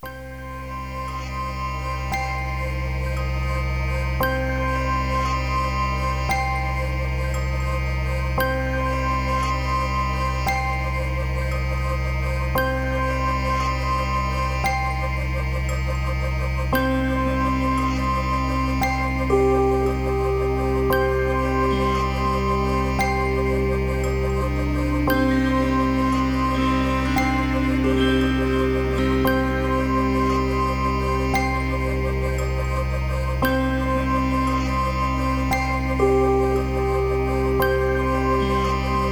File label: Dark Music